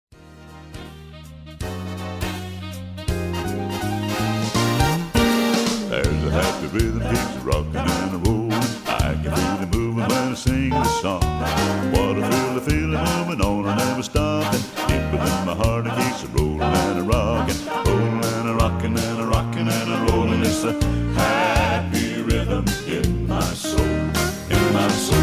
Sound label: Happy rhythm